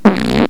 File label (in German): pups; geraeusche menschl